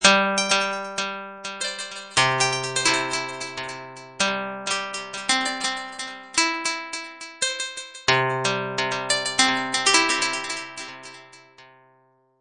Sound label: chimes delay; nyquist